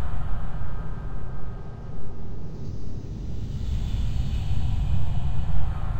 Amb Verb

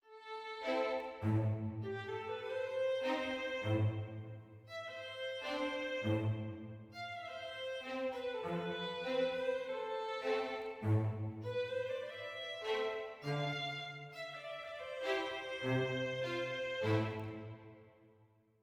mysterious med; Quivr; PS4 Windows gamerip 2016

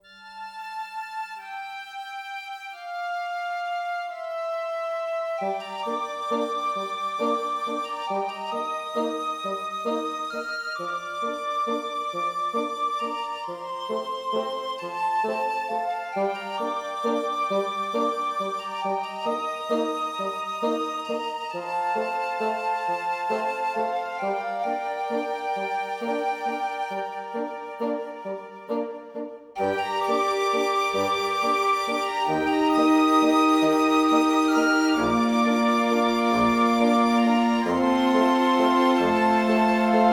Music Recovery Woodwind; 7 Mages

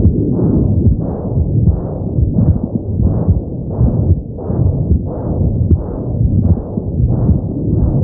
Heartbeat Tube loop; Kelly Slater Pro Surfer; macOS, Windows gamerip

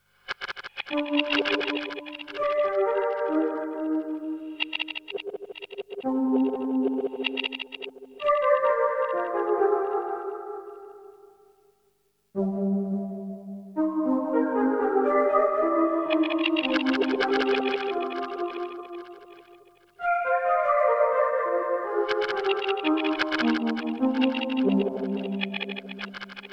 Heart; Marionette Itotsukai Sound Collection

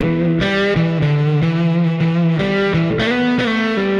Guitar wet; lego universe; Nimbus Station

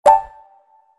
Percussive increase; WeMod

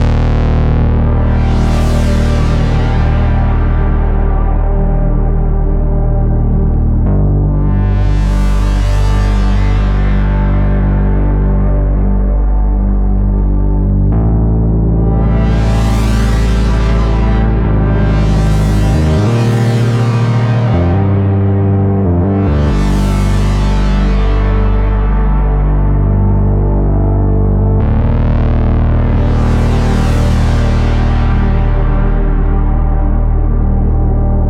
City Ruins Stem; Epitasis

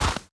walk snow; Aragami

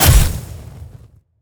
archer light arrow hit; Aragami

